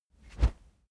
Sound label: whoosh wood cornered slow; swooshes-swishes